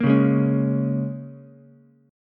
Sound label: Bb Minor 7th, down, Ukulele; Bbm7 strummed